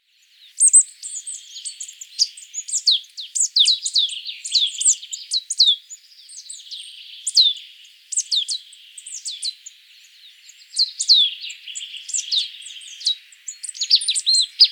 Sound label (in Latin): Poecile palustris; SETE